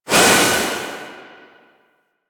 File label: Void Release Short; Weapons; Warframe; Duviri; Incarnon Adapters